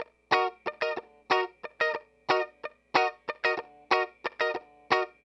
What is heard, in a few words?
091 Chill Pop Guitar Rhythm Ebmin, Chill Electric Guitar; Pop Rock; Pro Tools Essential Loops; Digidesign